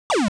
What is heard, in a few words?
destroy; wario land